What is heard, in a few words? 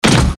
heavy metallic step